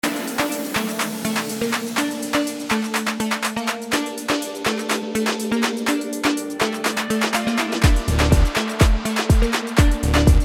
afrotrap

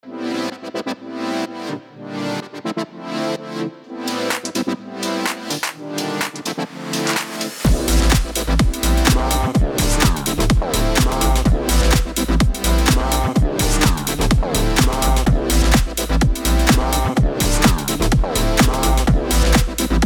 future house

